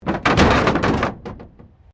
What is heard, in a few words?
Wreck Door Panel Clunk Metal